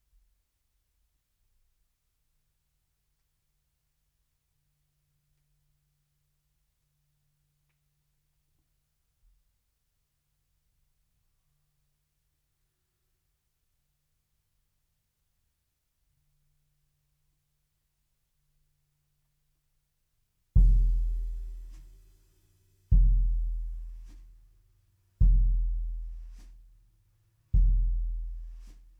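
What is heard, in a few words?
bass drum out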